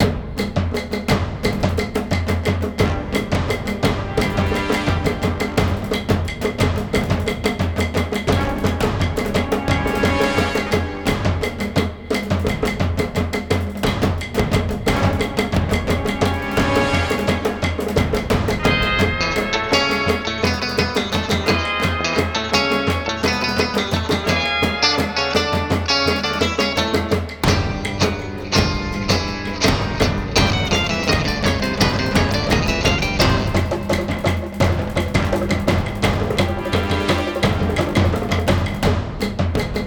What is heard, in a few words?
boss battle loop; warblower